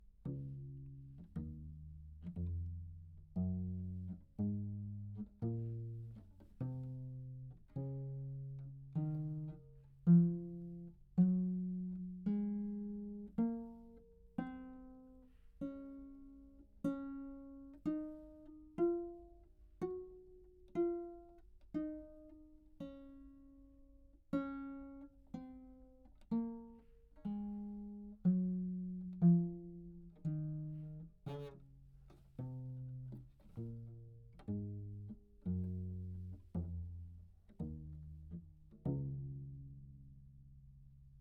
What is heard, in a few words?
pizz, bass viol, Anechoic; OPENAIR